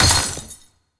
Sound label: freeze shatter